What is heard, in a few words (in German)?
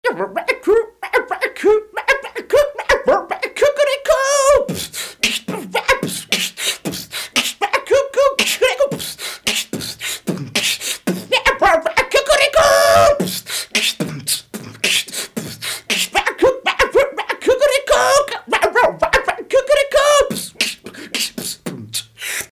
hiphop hahn